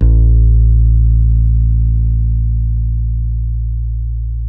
HOLLOW FRTLS, Bass; Spectrasonics; Bass Legends

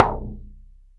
Foley Tub Tom; essential drumkit

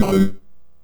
Synth Hit G

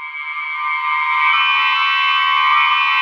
indicator; Spectrasonics; Bizarre Guitar

